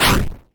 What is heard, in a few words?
Menu Change